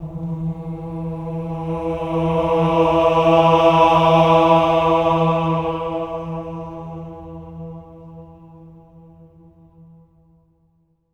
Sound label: AH E3, swell ahs, Symphony of Voices; Spectrasonics